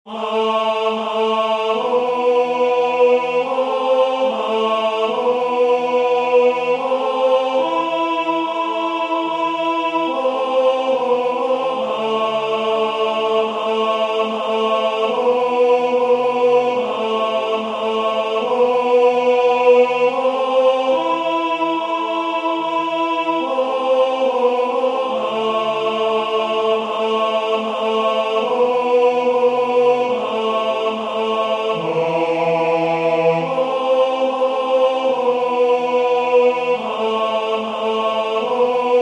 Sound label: tenor